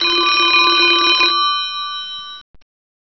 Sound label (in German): klingeln